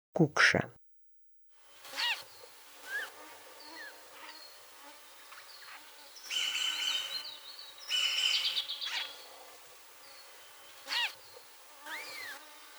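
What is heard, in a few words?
Siberian jay voice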